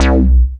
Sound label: BASS C3 R, Jungle; JGL BASS; USB Soundscan vol.05; Explosive Jungle; AKAI; 90s sample CD